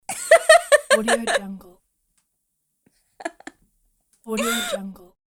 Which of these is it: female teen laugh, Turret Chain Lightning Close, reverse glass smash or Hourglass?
female teen laugh